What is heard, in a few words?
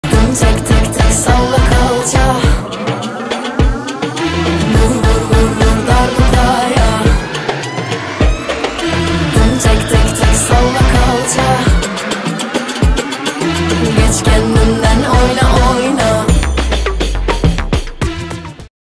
darbuka